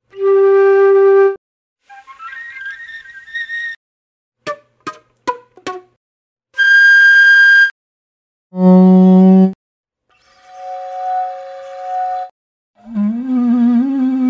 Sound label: flute